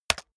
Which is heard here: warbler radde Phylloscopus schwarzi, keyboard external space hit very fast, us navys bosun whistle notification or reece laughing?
keyboard external space hit very fast